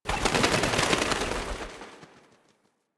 Birds Take Flight; Warframe; Ambience; Nineteen Ninety Nine

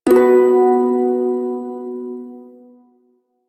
Prime Shawzin Chromatic Chord L; Music; Warframe

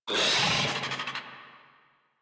Infested Charger Attack; Warframe

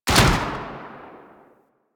Rifle Shot Alt B; Sundial; Warframe; Tenno

